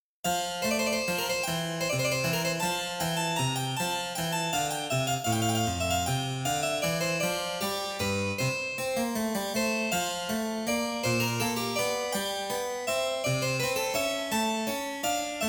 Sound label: Menuet; Handel